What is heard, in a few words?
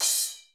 CHOKE, SY99; Crypton - DrumBase5000